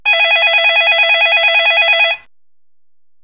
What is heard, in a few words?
Phone Ringing; household